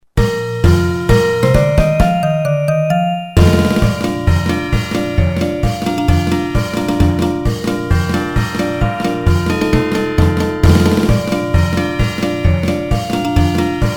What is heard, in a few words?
March for Perc